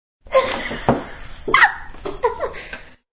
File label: Womans Laugh, A single humorous woman's laugh; Laughter